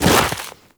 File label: Walk Deep Snow, Footsteps; Warframe; Operator